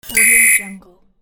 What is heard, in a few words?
technical control button